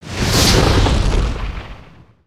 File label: Spinjitsu Fire One shot